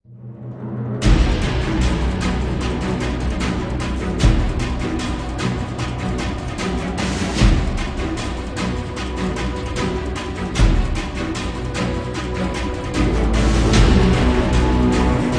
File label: bgm boss stage